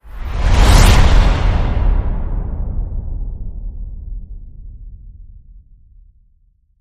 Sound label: Whoosh There It Is